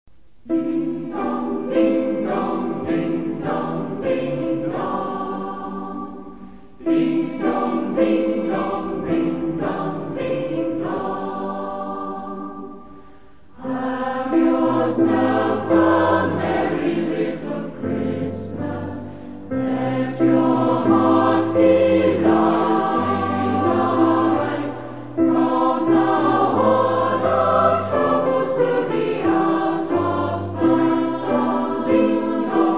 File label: Ding Dong Merrily on High